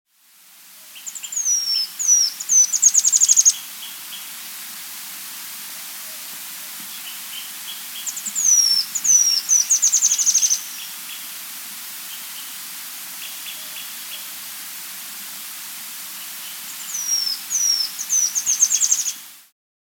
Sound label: Spot-Crowned Woodcreeper; S. America